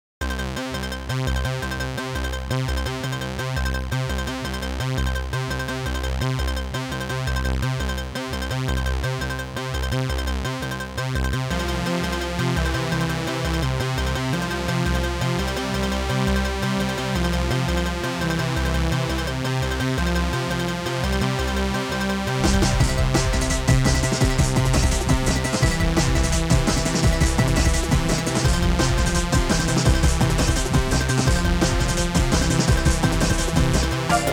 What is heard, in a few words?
inst